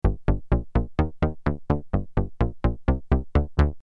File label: poly d bass CE